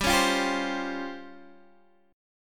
C sharp Major 7th Flat 5th G

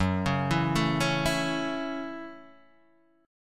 F sharp Minor 7th F sharp, Guitar DADGAD

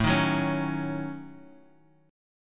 A 6th Flat 5th, Bass Standard, A6b5 chord; strummed; JGuitar

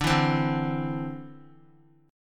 D 7th Suspended 2nd down Guitar; D7sus2 strummed

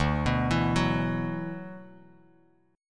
D 6th Add 9th D, Bass Standard; D6add9 arpeggiated; 10,14,14,11; JGuitar

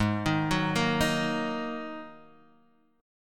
Ab 7th Suspended 2nd down Guitar Standard; Ab7sus2; arpeggiated; chordshape; JGuitar

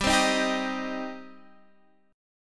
G Suspended 4th Sharp 5th, Guitar, Half Step Down

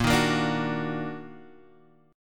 Eb Minor Major 7th Bb, chord

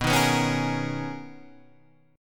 B Major 13th B Guitar Half Step Down

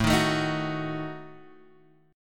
Eb Diminished A

